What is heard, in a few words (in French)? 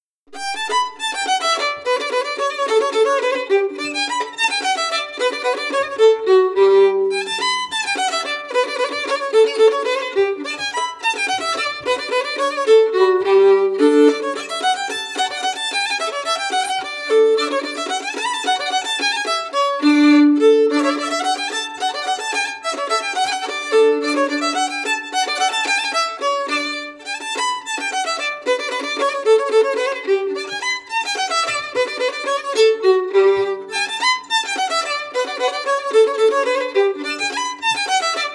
Bourree